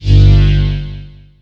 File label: Ball Fire